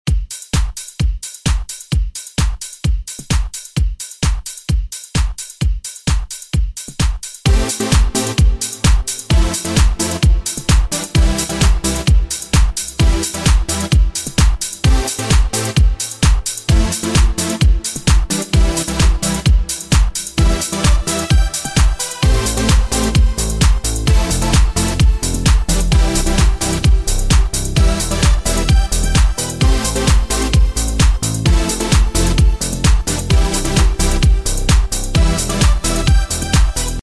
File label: ALPHAPLUS TRANCE